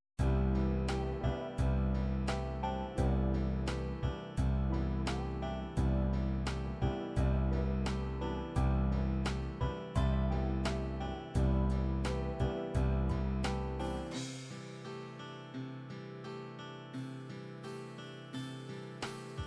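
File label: country ballad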